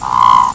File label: Wood frog